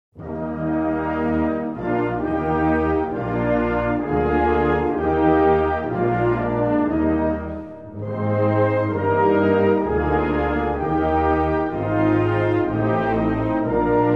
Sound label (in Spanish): Himno Nacional de Alemania